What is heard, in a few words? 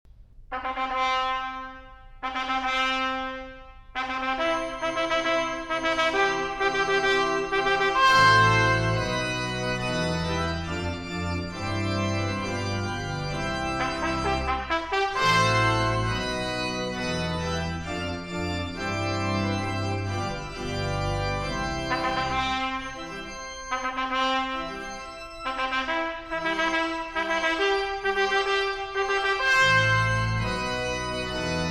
Mendelssohns Wedding March, classical trumpet